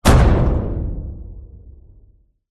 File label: impact slam hard metallic smash against; zapsplat